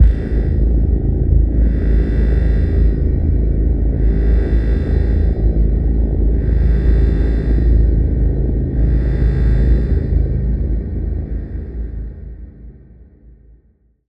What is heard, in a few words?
Space Core Engine; sfx; Routed Sound; Spire Expansion; Quantum Enforcer Vol.1